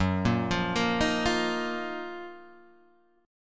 F sharp Major 13th Guitar Half Step Down

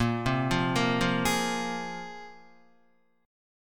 Bb 7th Suspended 2nd Bb down Guitar; 4E,4A,5D,5G,5C,6F; Bb7sus2 arpeggiated; JGuitar

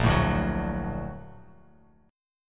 Bb Augmented Major 7th Bb, Bass Standard; Bb+M7 strummed; JGuitar

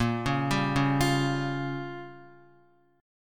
Bb Minor 6th, Guitar; x,1,1,0,1,1; Bbm6 arpeggiated; JGuitar

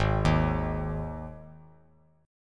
G sharp 5th G sharp; arpeggiated; JGuitar

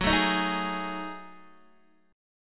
F sharp Minor 6th Add 9th, Guitar DADGAD; chordshape; down

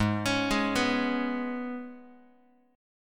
G sharp Minor Double Flat 5th G sharp, Guitar; G#mbb5; arpeggiated; JGuitar

